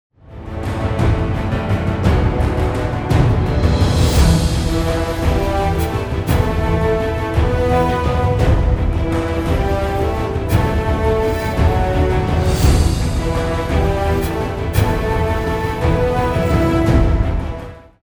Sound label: Mixing Mistake Way too much reverb